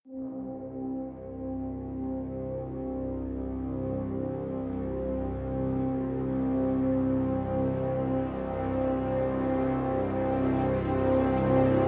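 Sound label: crescendo brass swell C; brand x music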